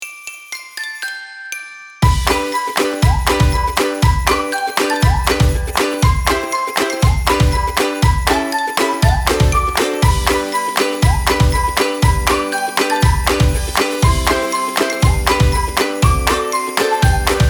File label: jingle bells